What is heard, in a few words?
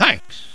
Right Serial#; Monkey Shines 1.2.1